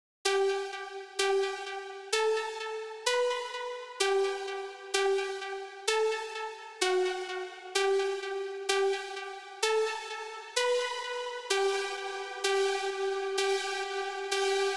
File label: 128 BPM E Min Pluck; House; Loop Stems; Cymatics; Breakdown Loops; Goliath